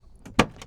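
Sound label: amc javelin exterior door open crispy; 1968; Pole Position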